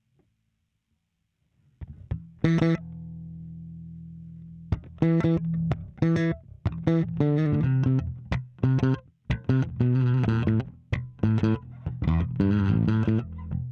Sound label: BASS 1176